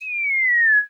Cartoon Bomb Fall Whistle; SFX Kit; Foley - Misc

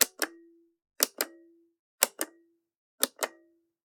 Generic Button Metal Small

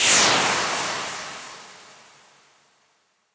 NOIS; XXL 800 Production Effects

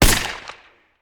Musket; Sound Ideas; Guns

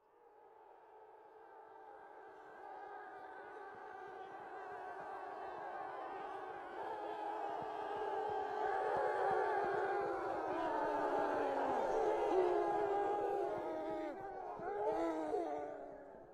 Harbor Village Market

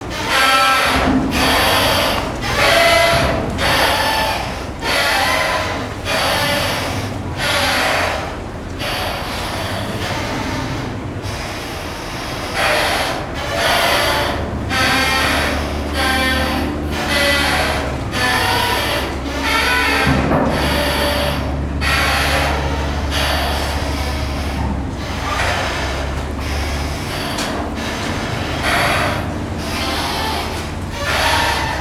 harbour dock; best service Blue Box; sea traffic

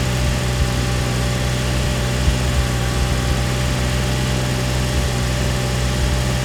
Porsche 911T Loop; Automobiles

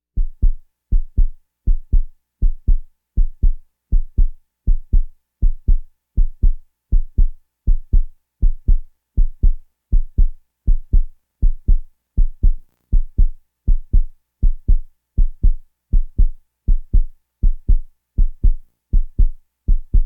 Walking Machinery Trucks

